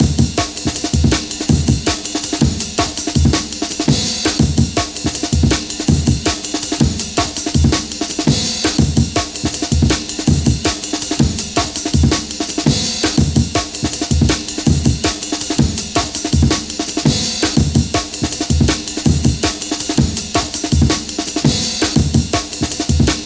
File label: Amen Break loop